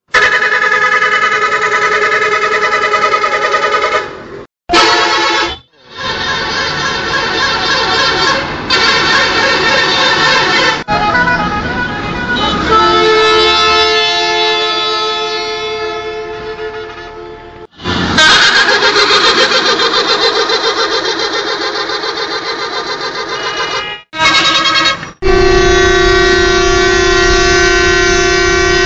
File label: bus horn